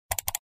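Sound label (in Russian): dvoinoe najatie myshki, mouse double click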